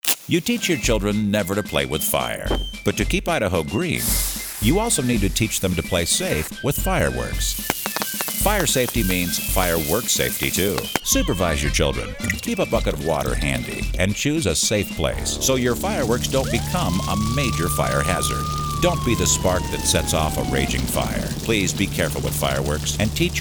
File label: fireworks